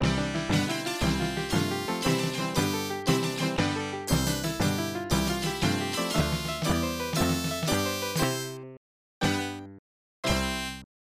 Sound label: Win Match; Championship Pool; SNES